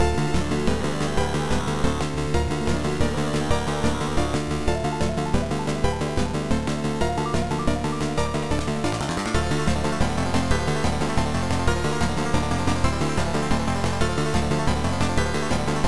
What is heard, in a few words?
Fire Warning